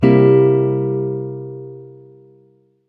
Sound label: dominant 5th